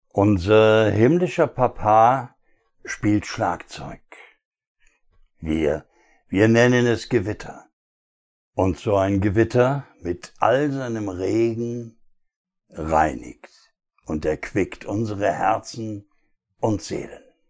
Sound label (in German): Unser papa spielt Schlagzeug